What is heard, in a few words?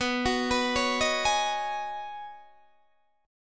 B 6th Add 9th B Guitar DADGAD, chord